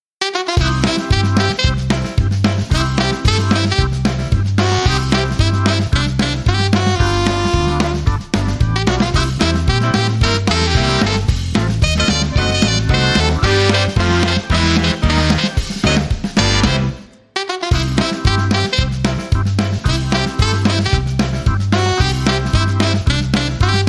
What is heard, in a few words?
Sax